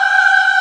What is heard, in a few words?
VOC; E-MU Producer Series Vol. 5; 3-D Audio Collection; 3D Multis; 3D Sprint Select 2